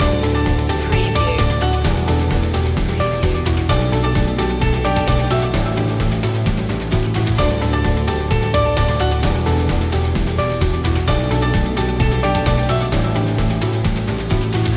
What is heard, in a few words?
corporate technology loop